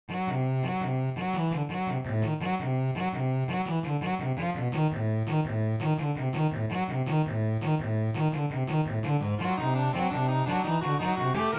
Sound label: Duel midi, ACCORDION and DOUBLE BASS